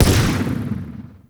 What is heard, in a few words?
Swarm Missile Explosion; Weapons; Warframe; Sentinel; Helstrum